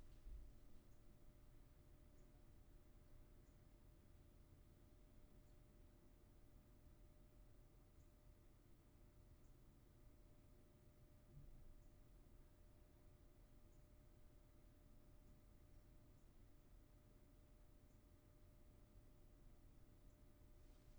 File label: room tone